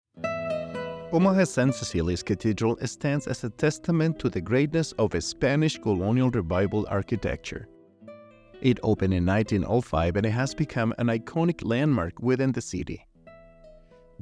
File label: Organ Recital Saint Cecilias Cathedral